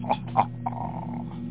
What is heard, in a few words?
hahaha